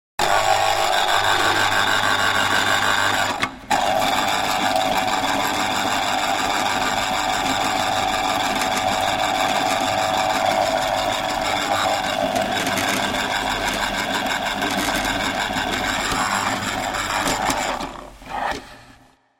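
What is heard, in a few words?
lemon or lime sounds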